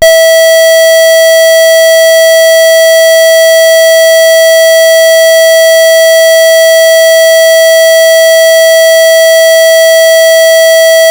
Continuous Sweden, Sweden SS031711 (all-clear signal); Continous tone; pfannenberg; signaling-technology; combined-signaling